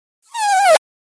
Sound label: Fart Foley Strain; farts